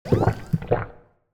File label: Potion; Minora-Game; Sound-Artlist